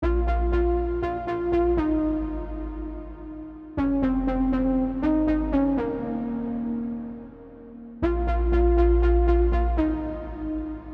substrings

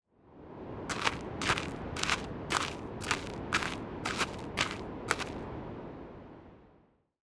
audiosteps gravel business

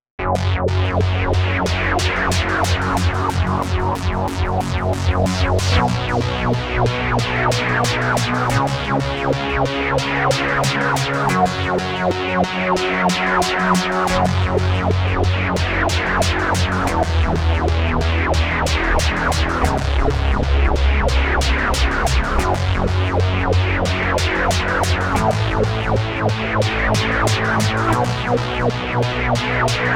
rythmic noise; akrab

